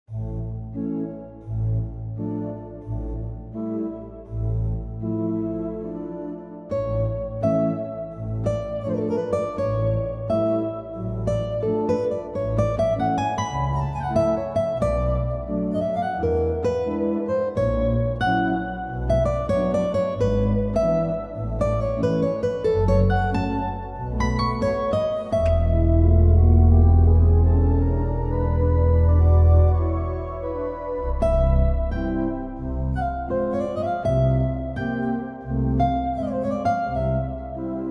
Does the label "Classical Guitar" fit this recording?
Yes